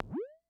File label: Multi, Vermona DRM1-MK2